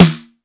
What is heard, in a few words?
MID PUNCH SN, HR-16; Hollywood Edge; The Big Bad Beatmachine; HR-16B